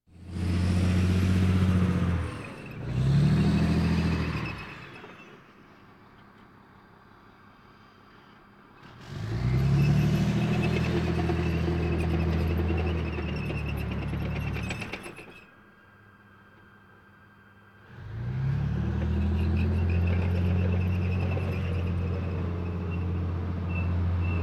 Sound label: Military vehicles